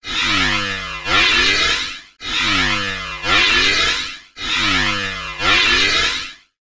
science fiction pulsating machine

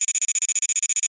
science fiction scanner scanning tone

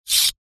industrial caliper metal slide fast

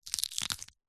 horror gore small subtle bone flesh break rip

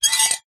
foley metal scrape squeak small

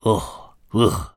human voice male ugh grunt disgust

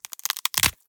Gore Bone Break Snap

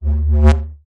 science fiction light sabre swing electronic hum

